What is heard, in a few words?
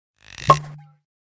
Homemade Flashbang Pull Cork; Lethal Company